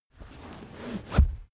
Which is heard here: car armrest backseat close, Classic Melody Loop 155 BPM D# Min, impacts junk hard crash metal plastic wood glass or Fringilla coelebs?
car armrest backseat close